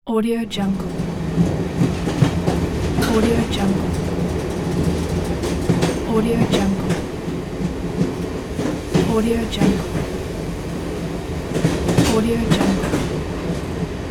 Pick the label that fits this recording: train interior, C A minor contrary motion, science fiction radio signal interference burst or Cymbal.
train interior